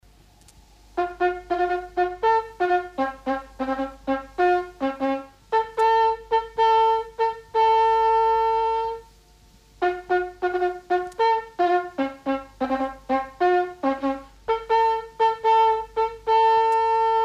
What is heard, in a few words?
military signal, lur bronze; revheim